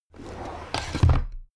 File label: drawer cabinet crammed close heavy